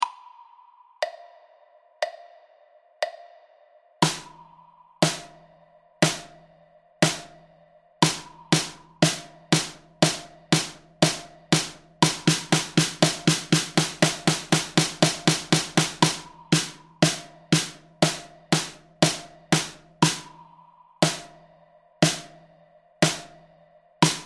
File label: stroke, drum